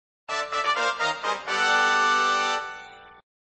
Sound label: Music Fanfare; Fanfare (after a mode has finished); Indy